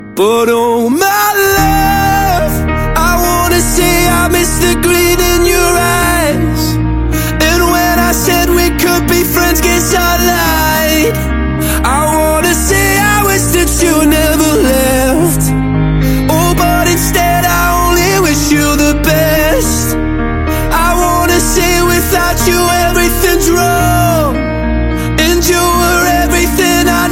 English Love Song

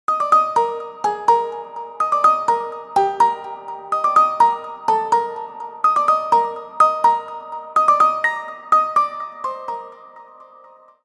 Liquid Z530 - Acer Ringtones

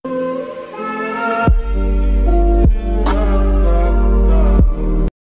Sweet Text ringtone

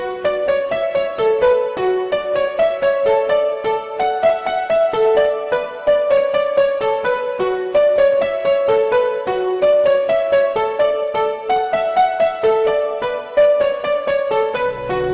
Chimes Ringtone; Samsung

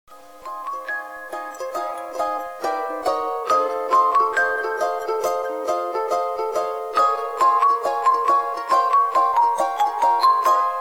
Huawei Mate 40 Pro Ringtone; Hawaii

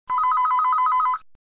Huawei Mate 40 Pro Default Ringtone

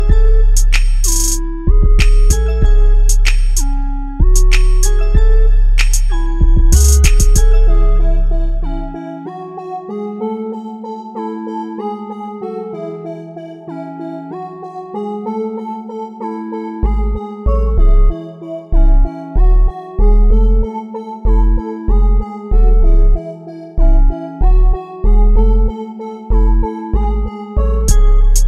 English Music

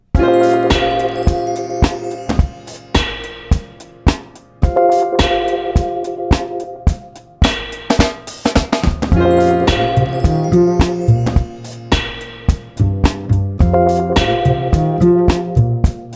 Romantic Love Remix Ringtone; Vivo

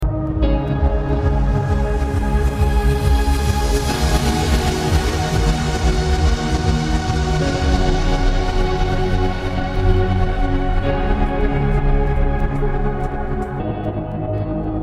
Best Classical

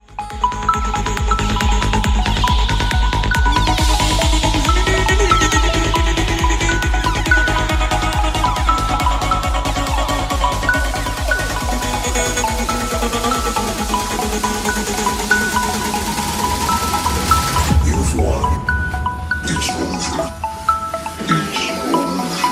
Xiaomi Redmi Poco F3 Ringtone

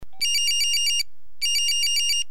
Motorola Old Phone Ringing

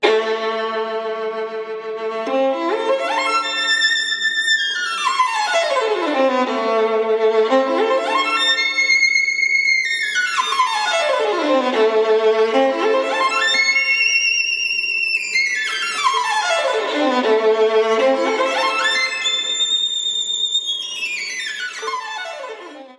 Sitar Music Ringtone

